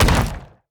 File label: tower rocket Gunners sting Missile explosion